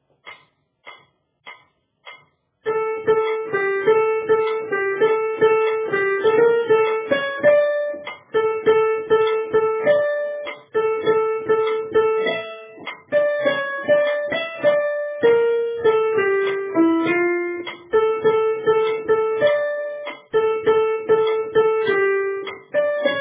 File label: Tenor, Accompaniments